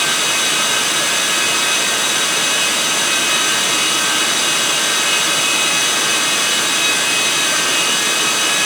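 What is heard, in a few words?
L-39 AI25 RPM3 side, aircraft engine; DCS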